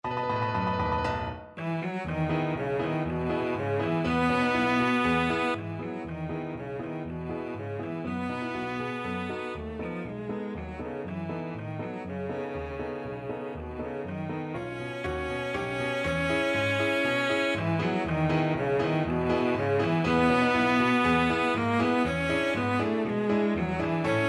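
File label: violoncello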